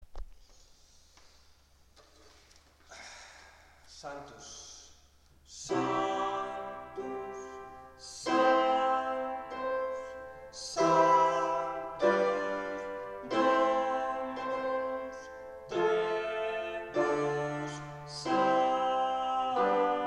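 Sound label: soprano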